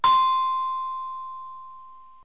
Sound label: DOORBELL; Wired for Sound Pro CD; Aristosoft, Inc.